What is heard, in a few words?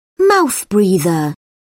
mouth breather